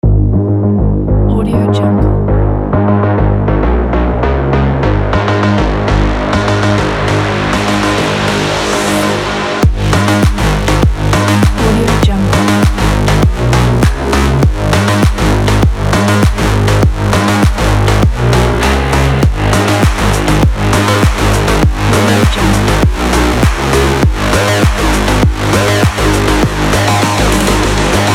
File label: techno sport